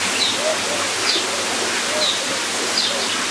Birds Various

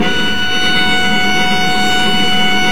STR VC HAR, Vc Harmonics; String Master Series; Vc Marc&Harm

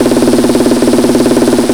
sdr 138, Techno, snare-rolls; Techno ID; drum-loops

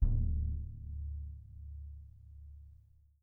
BD PP, Percussion; Miroslav Vitous - Percussion & Harp

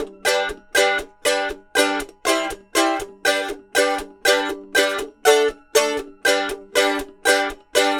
Mandolin Chuffs; Continental Drift Aftershocks

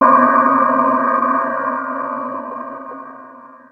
BELL. HIT C3; Big Fish Audio; Synth City

